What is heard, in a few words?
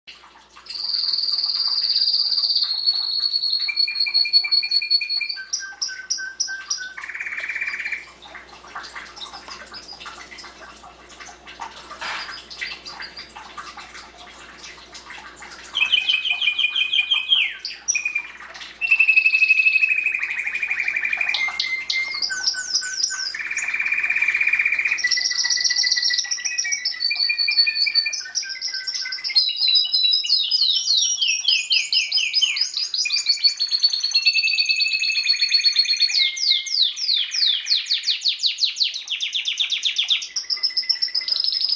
canary avaz